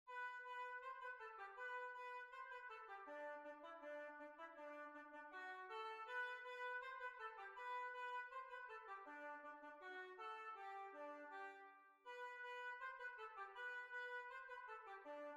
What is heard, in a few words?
Holywell Hornpipe